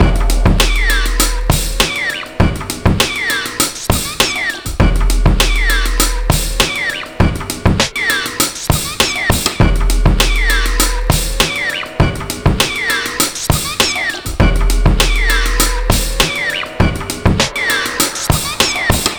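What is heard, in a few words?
drum, 100bpm, Breakbeat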